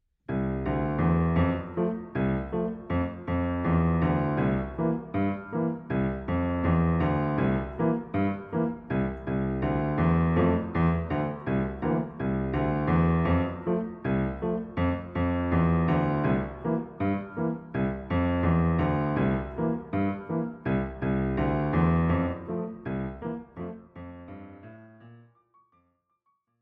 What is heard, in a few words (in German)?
Die Dorfkapelle; Avanti Musicanti